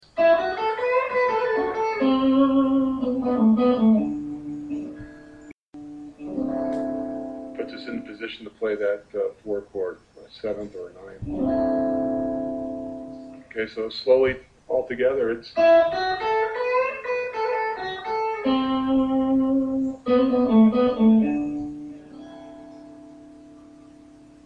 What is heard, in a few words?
slow blues IV chord lick expanded, guitar